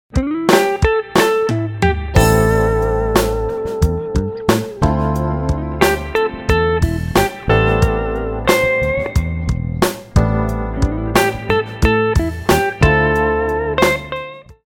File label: blues